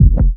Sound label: Kick